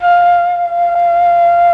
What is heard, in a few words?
FLUT; ILIO; Propeller Island Legendary M400